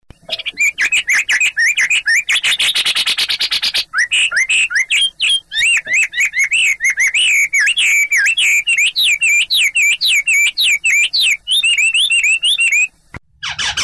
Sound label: hwamei